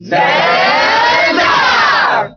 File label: Cheer Italian; Zelda; SSBB